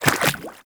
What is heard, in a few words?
Small Walk Water, Footsteps; Warframe